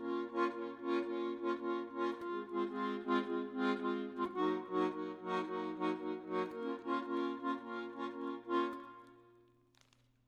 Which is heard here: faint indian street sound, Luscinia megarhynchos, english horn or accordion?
accordion